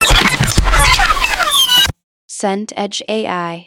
vinyl backspin

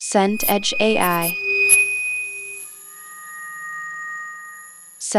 drone glass

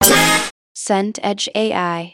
brass stab short

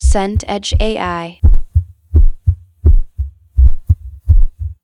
heartbeat

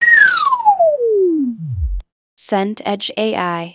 fall pitched

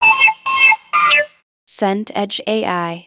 notify triple

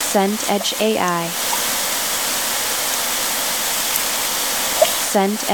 pond still ripples

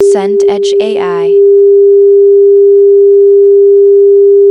phone dial tone

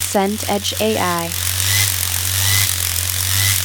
hedge trimmer

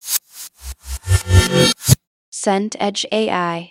swell bright

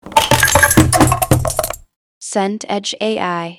ball bowling strike

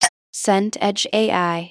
hat closed

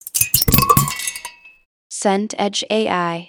pour wine glass

